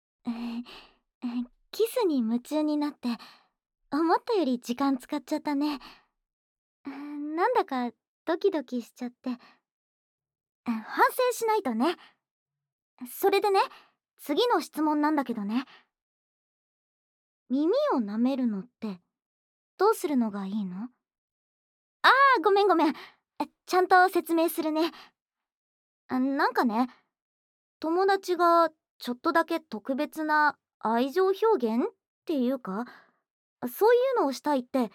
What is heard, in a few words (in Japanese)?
耳を舐める (右)